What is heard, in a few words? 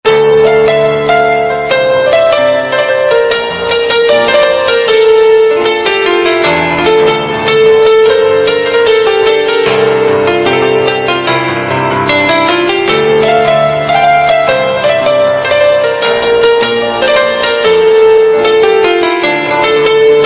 instrumental bollywood song tone ringtone